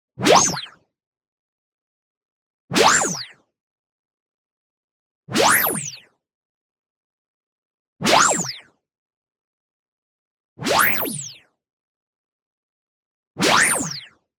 MOVEMENT FAST Aggressive Zip; boom library; Anime Essentials; DSGNMisc

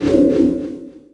Pickup Bounce; Pickups

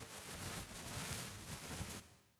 Rags

